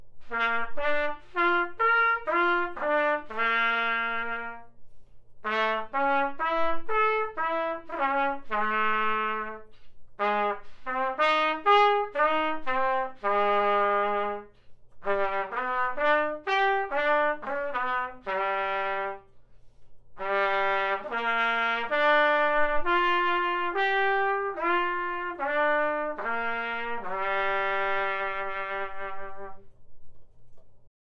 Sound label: Trumpet